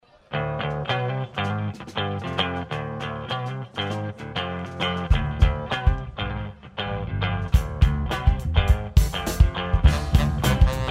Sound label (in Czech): tambor